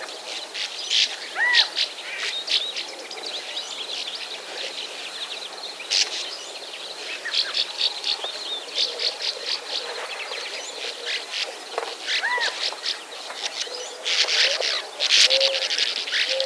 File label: Bank Swallow, Riparia riparia; swallows swifts